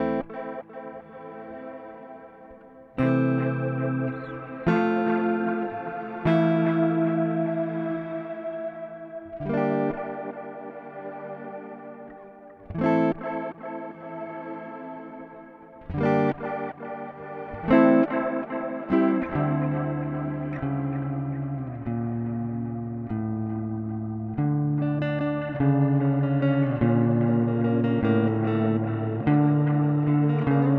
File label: Amplitube X Time Arctic